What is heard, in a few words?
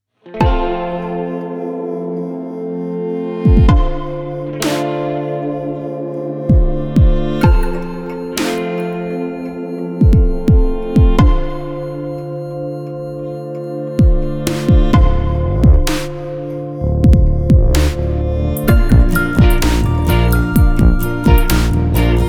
instrumental